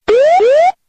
woop hoop

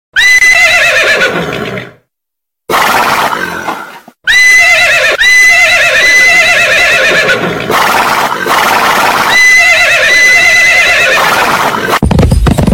strange loud horse

